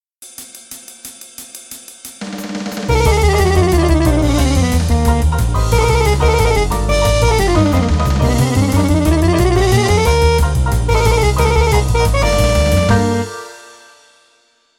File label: mechanical chromatic motif